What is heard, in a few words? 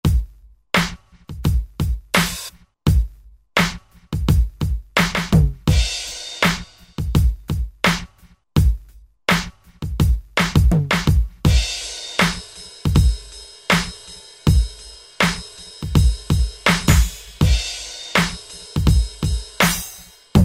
Hip-Hop; Roland SR-JV80-12 Hip Hop Collection